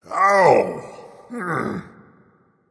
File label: ouch, voice; Smite; ymir skin6